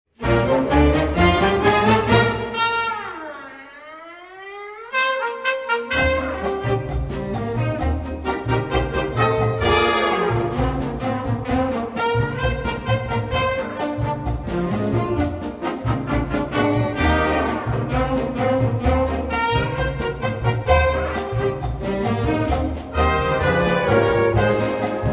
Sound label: ChaCha